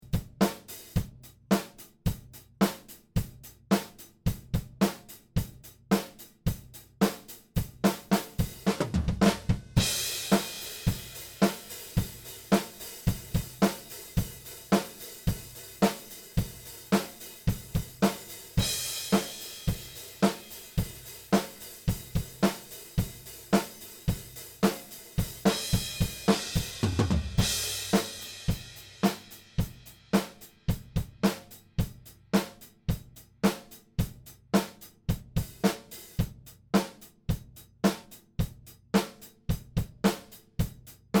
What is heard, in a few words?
Untouched Drums